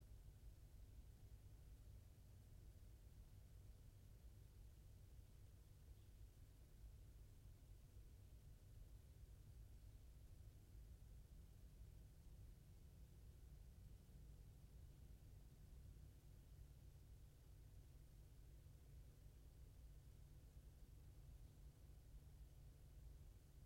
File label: yamanakako sound